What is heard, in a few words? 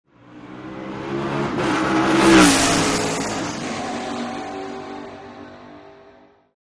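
Sports Car Close Up By Fast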